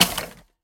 Weapon Electronics

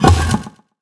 Shoot Telegraph; Troll Lobber SFX

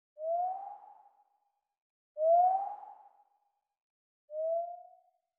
Fly in; Monmen

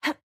Attack Junk; Jackie

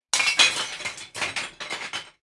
Ambience Trash

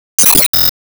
Enemy Fashion 2nd Sp Damage Shot

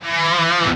Electric Guitar

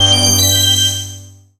Powerup; MLB Home Run Derby; Android, iOS

